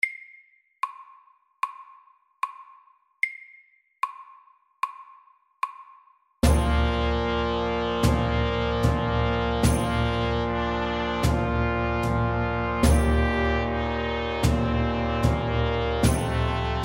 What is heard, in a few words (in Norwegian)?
engelsk melodi SLOW